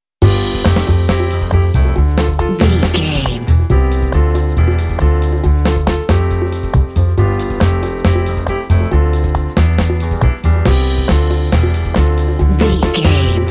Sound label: retro tv theme rhythm section